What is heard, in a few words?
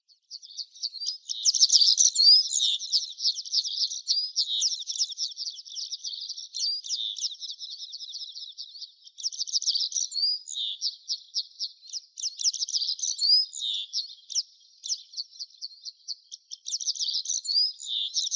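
Transbaikal pipit, Anthus godlewskii; konek zabaikalskii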